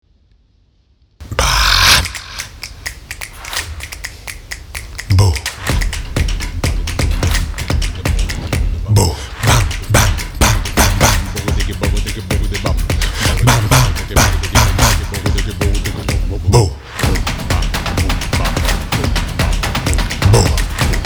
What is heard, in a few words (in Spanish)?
bamboo musica